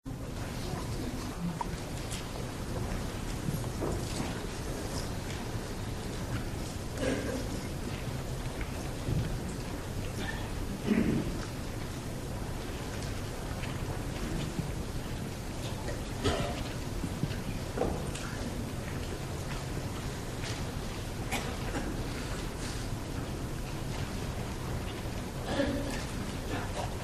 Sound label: silence